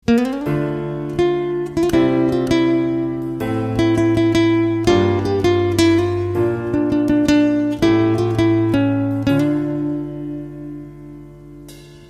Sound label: gitar ringtone